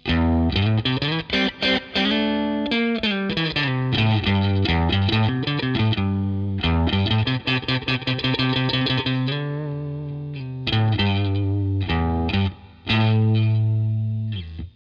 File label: tone; wax